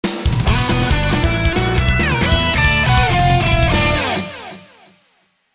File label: jingle, music; VirtuaTennis; CyberMycha 2002 May